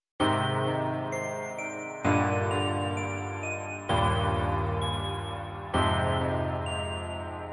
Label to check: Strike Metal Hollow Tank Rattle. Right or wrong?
Wrong